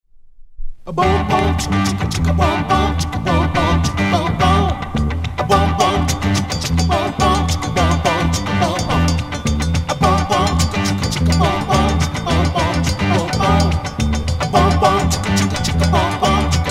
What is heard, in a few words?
Anvil Chorus Rhythm